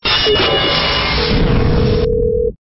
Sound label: Stinger; STINGERS